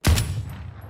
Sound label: Deagle